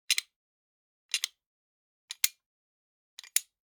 Generic Click Metal Tiny; boom library; The Interface

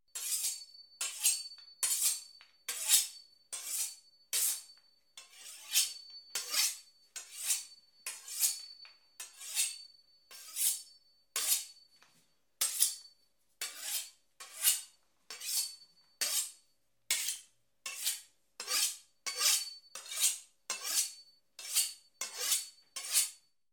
Knife Being Sharpened, Scary Sounds; 1001 Sound Effects; Halloween Horror Sounds Effects

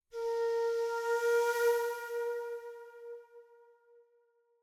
Flute Tone - Light Increasing; Hollywood Edge; sound designer's toolkit v2

